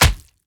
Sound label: hit; tactical shooter; multiplayer fps